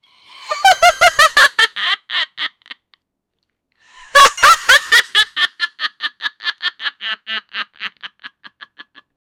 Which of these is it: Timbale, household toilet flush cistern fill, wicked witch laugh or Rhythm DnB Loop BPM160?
wicked witch laugh